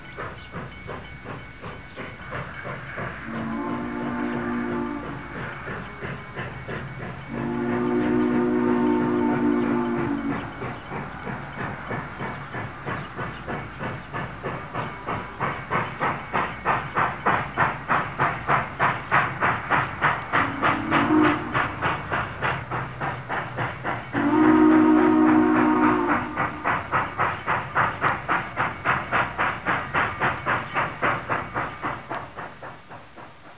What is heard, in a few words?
Steam Engine Leaving Station